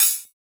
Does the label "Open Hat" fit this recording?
Yes